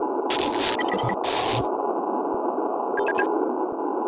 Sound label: tech ambience, tech mech; sadpuppy